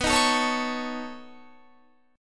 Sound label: B 9th B down Guitar; strummed